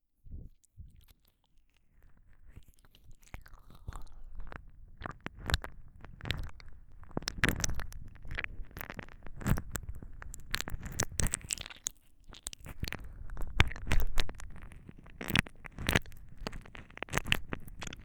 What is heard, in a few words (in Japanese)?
ゆっくり耳舐め